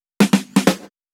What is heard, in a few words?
Fill 128 BPM; Vengeance Samples; VEH1 Loops